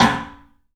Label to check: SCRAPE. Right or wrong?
Wrong